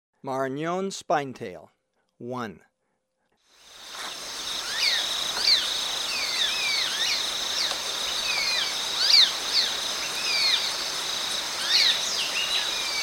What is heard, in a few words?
Maranon Spinetail, Birds of Ecuador; S. America